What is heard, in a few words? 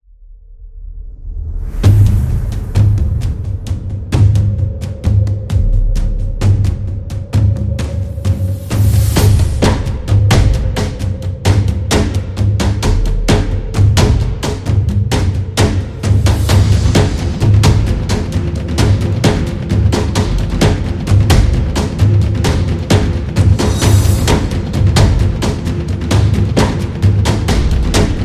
Warrior, war drums